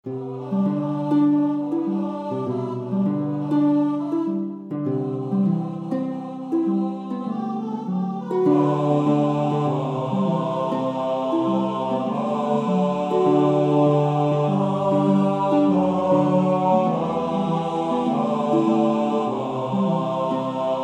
Bass

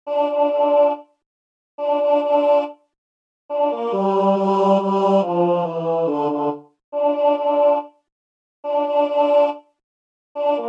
Men

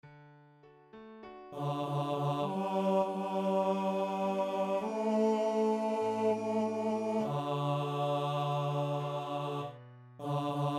Bass

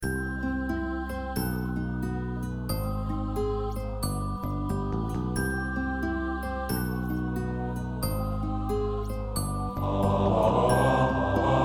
Bass

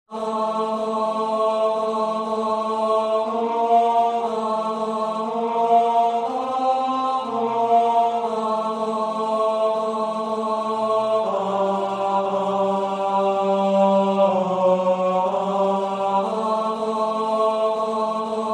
Tenor

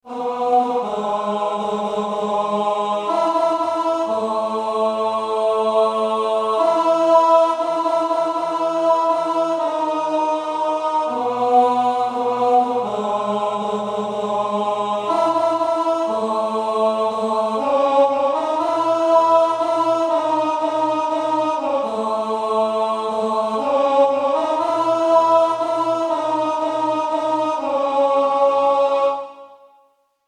Roll you sweet rain Tenor

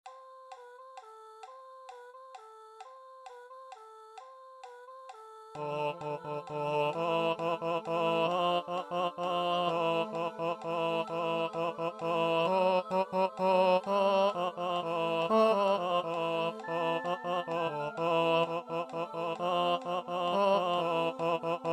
Tenor

